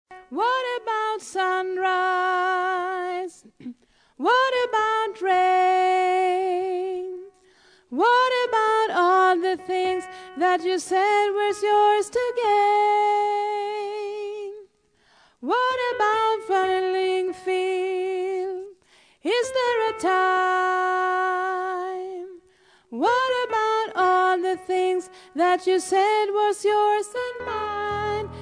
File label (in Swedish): bas, stammor